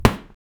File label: throw ball